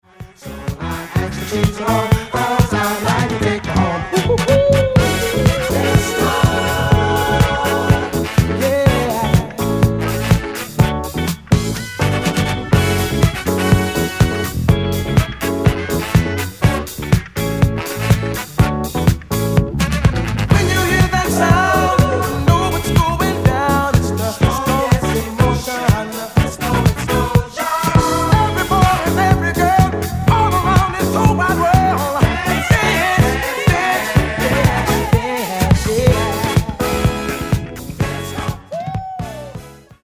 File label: Disco